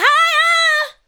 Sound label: ADLIBS